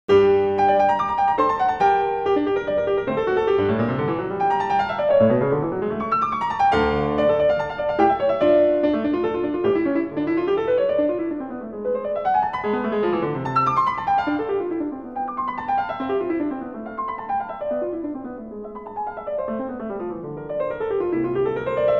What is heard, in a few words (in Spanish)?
desarrollo